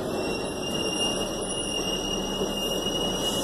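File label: bremse quietsch, brake squeal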